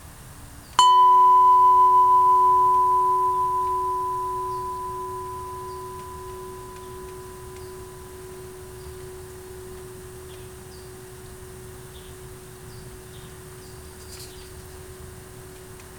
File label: chime